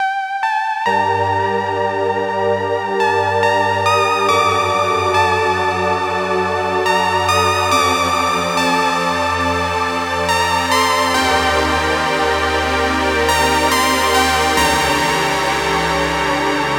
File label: Big Trance Arp Lead